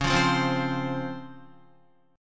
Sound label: C sharp Major 7th, Guitar; C#M7 strummed; JGuitar